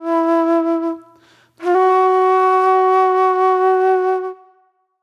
Mellow Flute

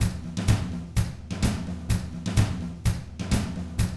127 Drums

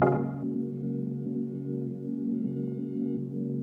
Stab Hanging Chord; FX; Lost Tapes From Mars

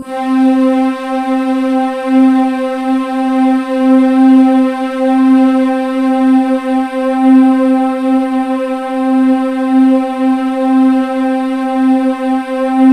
C2, Synth; 90 s Sample CDs; NiceBeats; SynthLine VOL-15

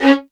C#4, LOUD VIOLINS; Q Up Arts; Denny Jaeger Master Violin Library

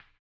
HAMBONE, Vocal Blues Soul; Spectrasonics; Planet

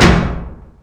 DRM F2, YAMAHA R5+RX; Best Service ProSamples vol.55 - Retro Sampler; AKAI

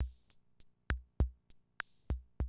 CR78, DrumLoops

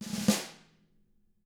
SNARE ROLL

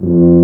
Tuba mf F1; Digital Sound Factory Virtuoso

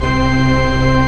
Pipe Organ C2; Proteus 2000; Digital Sound Factory Proteus 2000

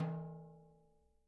Living Drums, JZ XTOM 1

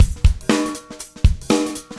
Breakbeats loop, 70_s Breakbeats; USB Soundscan vol.46; AKAI

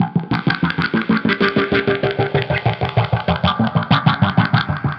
Processed Electric Bass

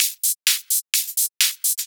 Hihat Loop 128 BPM

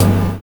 LOW TOM O; Univers Sons - Kitch 70s

